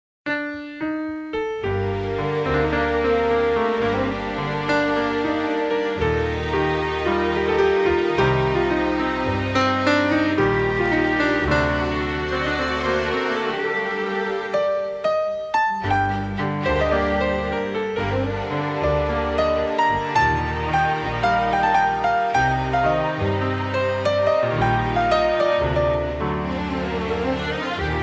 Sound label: awesome music